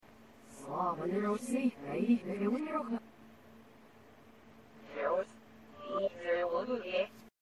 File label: pee; reverse